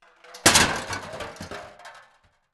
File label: Coke Cans Disposal